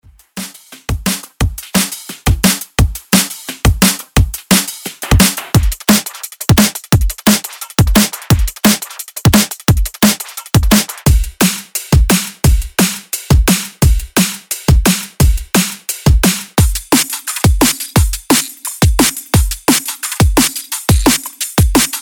drums

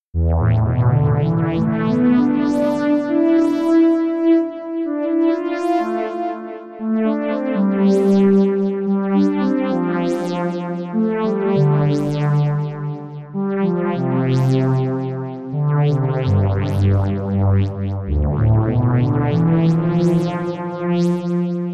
mindphaser sequence

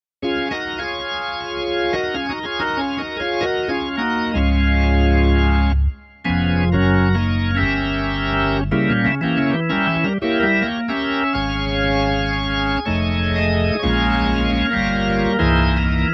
gospel

